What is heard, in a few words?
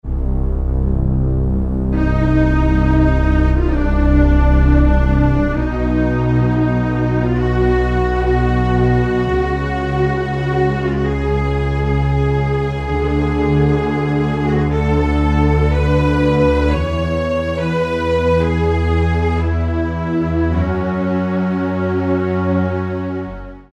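String Arco; Factory Presets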